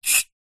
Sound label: industrial gas release hiss short from gas bottle